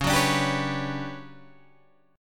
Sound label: D Major 13th Db, chord